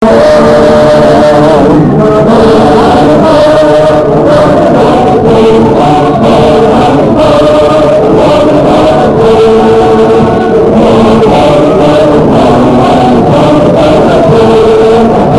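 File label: Pray, Brethren Pray, Singing; Chocoloco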